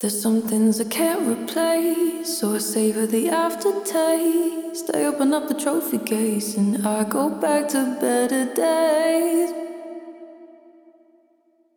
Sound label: Glow Female Vocal B